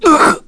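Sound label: Vox Damage, Korean; Dimael; kingsraid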